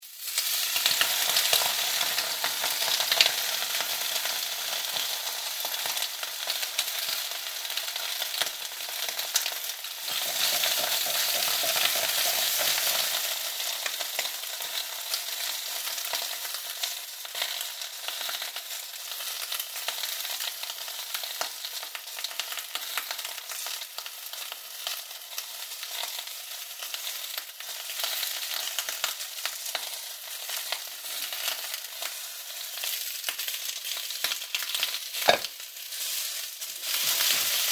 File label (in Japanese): 炒める音